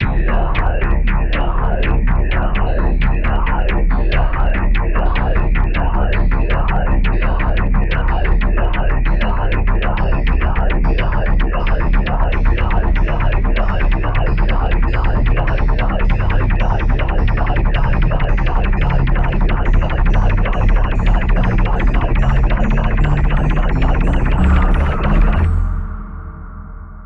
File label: Caught Music; Night of the Consumers